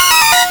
Beat

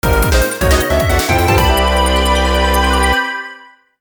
Mission Success; Fate/Unlimited Codes

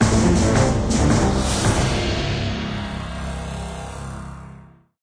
Boss Kill; Hero Siege; Android

